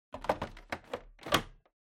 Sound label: Air Purifier Tank Insert